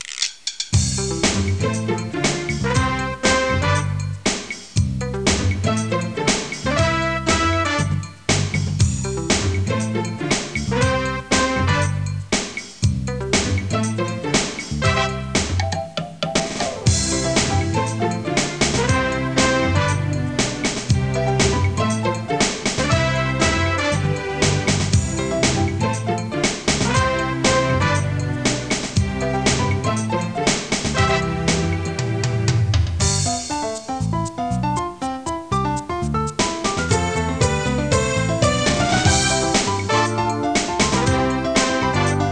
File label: MENUS; Touch Games 2